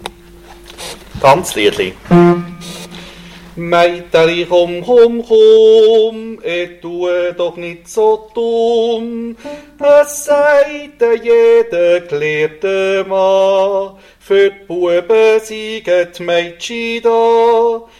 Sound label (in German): Tenor